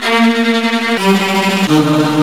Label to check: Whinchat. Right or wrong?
Wrong